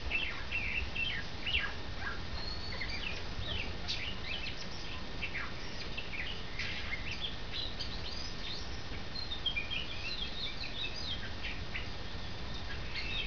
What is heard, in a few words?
copsychus saularis